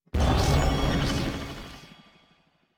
幻术师准备镜像声

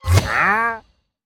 流浪商人消失声

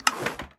织布机选择图案声

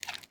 熊猫进食声

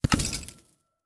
宝库弹出声

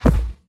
北极熊脚步声

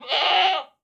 山羊尖叫声

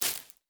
蜘蛛网破坏声

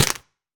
红树根踩踏声

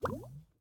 滴水石锥熔岩滴入炼药锅声

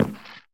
嘎枝之心踩踏声

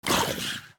受伤声; 尸壳

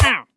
流浪商人受伤声

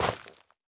下界疣踩踏声

